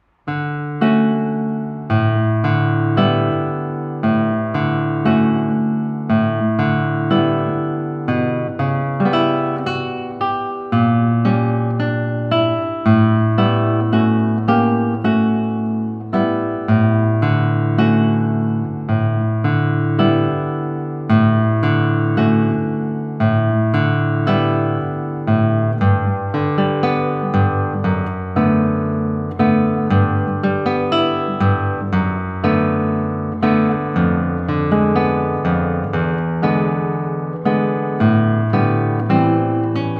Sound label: Nocturne; Rainforest